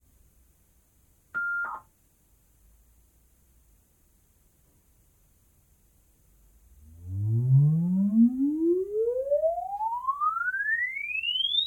MAN Lions Coach - IMPULSE RESPONSE - Toilet - INTERIOR; Pole Position; The Vehicle Impulse Response Library; Altiverb; MS Decoded